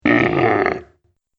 Orc Primitive; horror; Monster Sound Effects

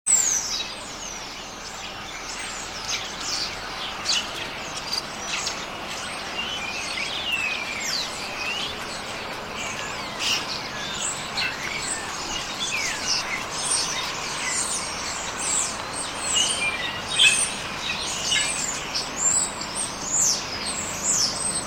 Starlings, Large flock of starlings.; Birds; Animals

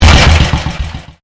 Convolution Pulse

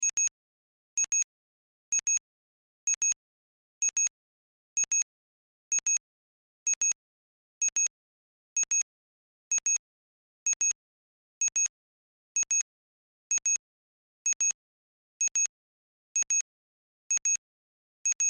Science Fiction Computer Beeps Loop; Computers

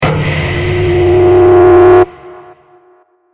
Trailer Tension Hit And Riser; Miscellaneous Impact

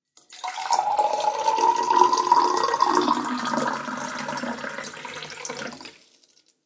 Water Pouring A Drink; Water Sound Effect; Splish, Splash, Splosh!

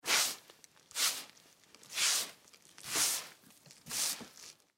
Broom Sweep Multiple Strokes Dust Dirt; Cleaning; Foley; Blastwave

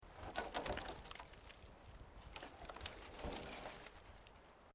Wood Logs Movement Minor; Materials

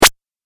8 Bit Style Laser Blob; Video Game Sound Effects

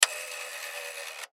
Telephone Rotary Payphone Antique Dial Zero; Fast Return

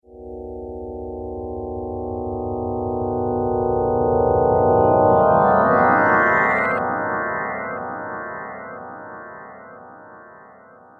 Haunted Chimes, Scary Tone Sound Effects; horror

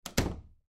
Bedroom Door Close; Household; Blastwave

